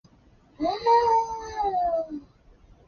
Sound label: loading up a puke; plague